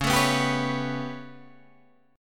B 7th Suspended 2nd Sharp 5th C sharp

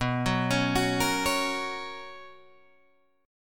B Major 7th Suspended 2nd B Guitar Drop D

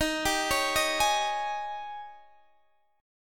D sharp 11th D sharp; arpeggiated; x,18,17,18,16,16; JGuitar

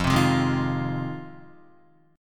F Minor 7th F Guitar; strummed; JGuitar

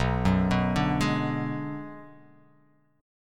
B Minor 6th B

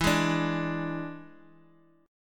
E 7th E down; E7 strummed; JGuitar

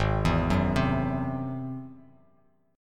Ab Augmented 7th Ab down Bass Standard; Ab+7 arpeggiated; JGuitar

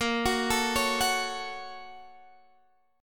A sharp Minor Major 7th Sharp 5th

